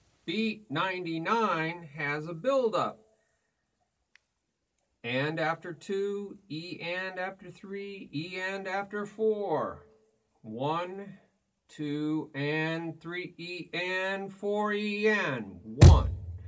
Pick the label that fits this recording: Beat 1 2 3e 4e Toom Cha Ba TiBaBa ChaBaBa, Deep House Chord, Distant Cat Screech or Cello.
Beat 1 2 3e 4e Toom Cha Ba TiBaBa ChaBaBa